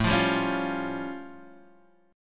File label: A Augmented 7th A Guitar Drop D